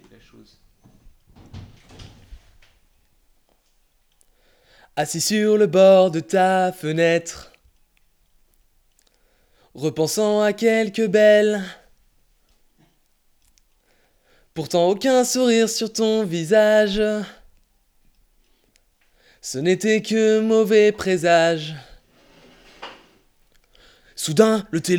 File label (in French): Voix principale